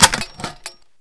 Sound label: rocket in, weapons; valve